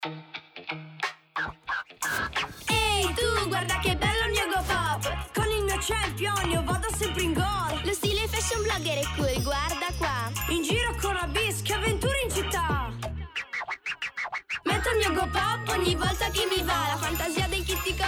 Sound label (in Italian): canto gopop